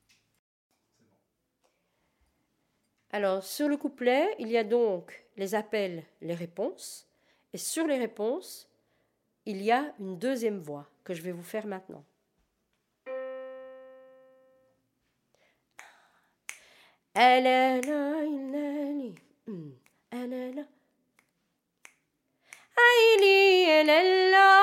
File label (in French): FARAHTSINI COUPLET REPONSES VOIX 2